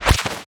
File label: bow hit electric; Cielonos